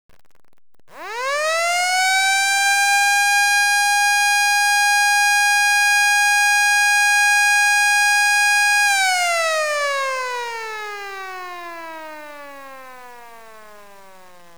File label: siren, Federal Signal